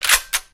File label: spas12 open, weapons